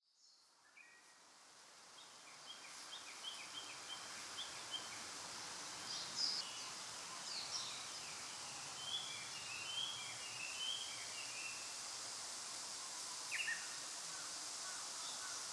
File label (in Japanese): 東京都三鷹市「国際基督教大学」周辺で録音したうぐいす・ムクドリです。; 2025年5月下旬に東京都三鷹市「国際基督教大学」周辺で録音したうぐいす・ムクドリです。; 野鳥の声